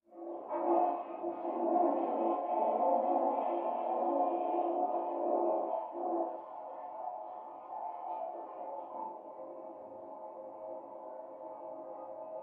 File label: Toilet Flush Water, pipes